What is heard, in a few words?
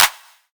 clap a sharp key; percussion; single hits one shots